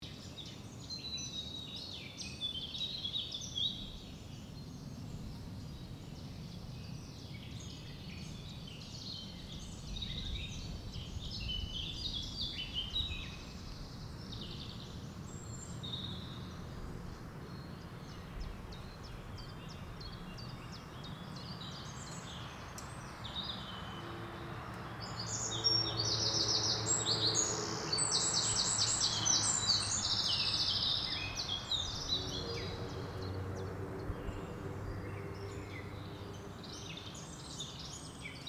Forest Sound Effect Birds singing x drive away x fly